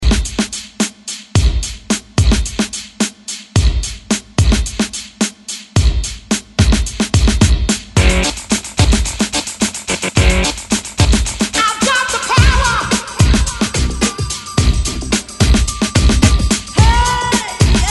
90s Re Drum 109 Bpm; DJDOLLS

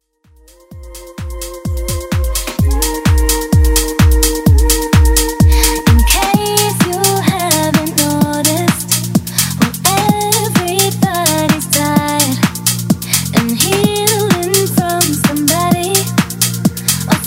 Mash Up

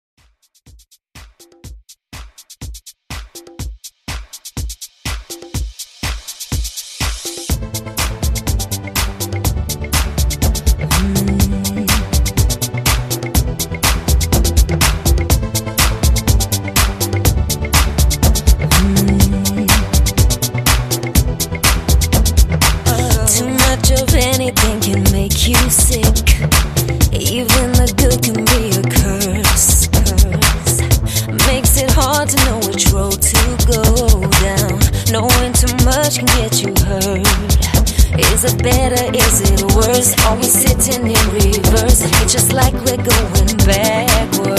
R B Redrum 123 BPM

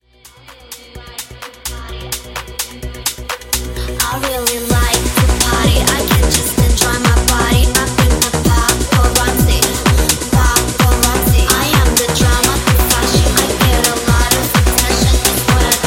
Bass House 128; Remixfreakz

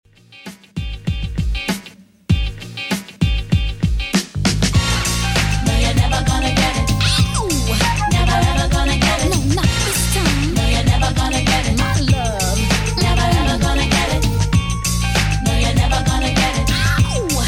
90s Rnb Redrum 98bpm

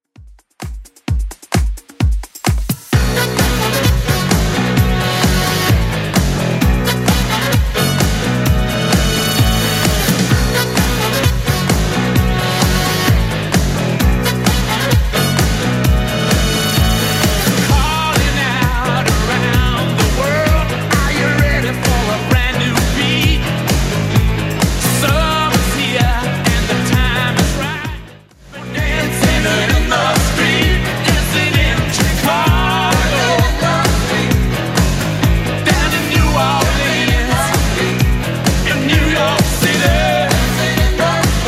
80s Club 130 bpm

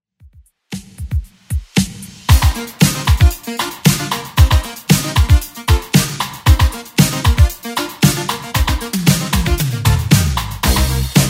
80s 115bpm; Redrum

